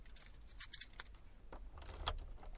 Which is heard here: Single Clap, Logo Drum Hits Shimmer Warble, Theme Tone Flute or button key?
button key